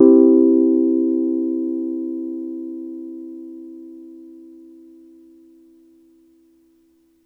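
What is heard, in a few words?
Piano Wurl major soft; TrashLibrary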